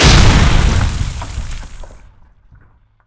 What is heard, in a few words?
bust concrete